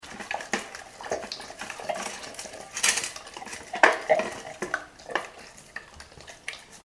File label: animals dog labrador eat chicken bones in metal bowl; Dog Eating Bones From Bowl; Dogs; McKinney Sound